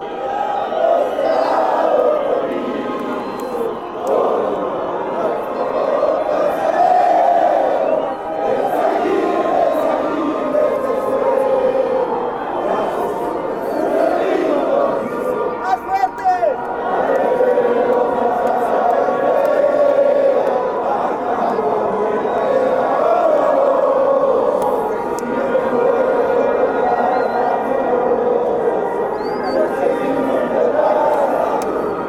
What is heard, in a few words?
bolivia national anthem